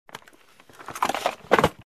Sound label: Handling Luggage